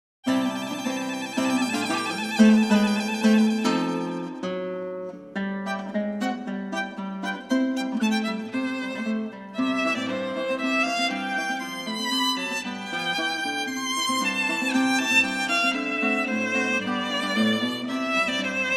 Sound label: Mother, for violin and guitar